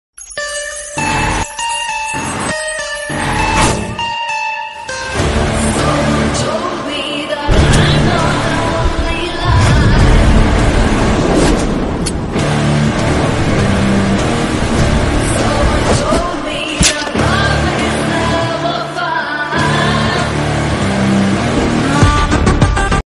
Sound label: villain entry bgm ringtone mass ringtone music